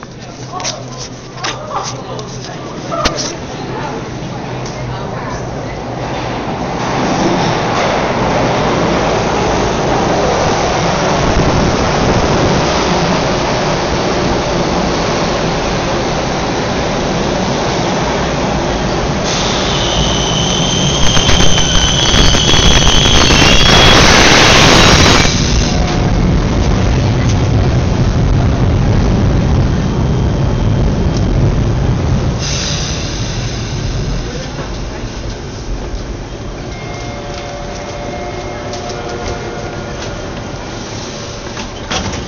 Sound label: subway train